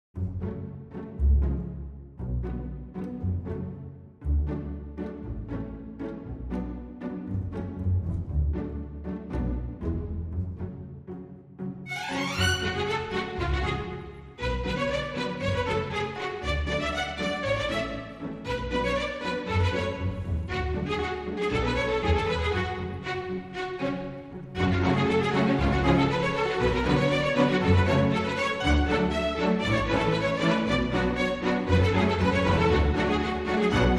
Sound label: Strings